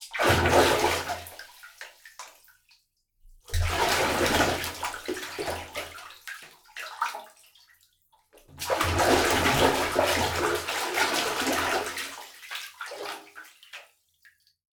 Water, Splashes, Bath Tub, Roomy, Dunk, Slow, Hard, x3